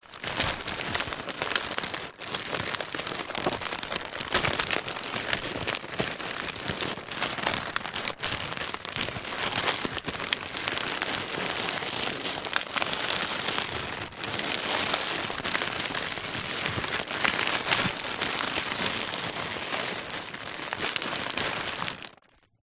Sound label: foley present gift wrapped handling; zapsplat